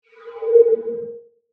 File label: Whoosh Phase Descend; Whoosh, Pass, By, Heavy, Reverb, Production, Element, Imaging, Accent, Transition; BlastWave